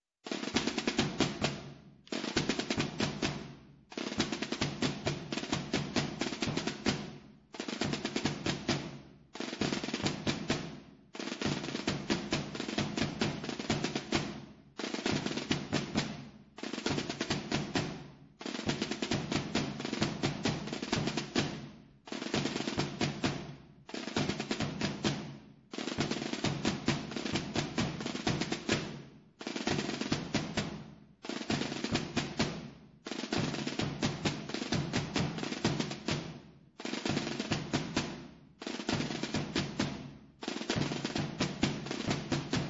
matabombos, tambores; ALBALATE

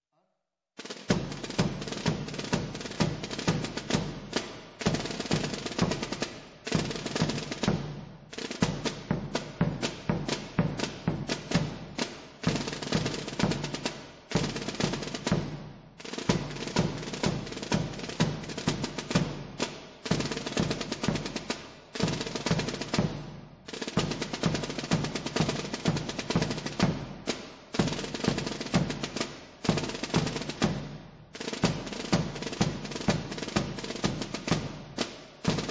vieja, tambores; Hijar; La Vieja

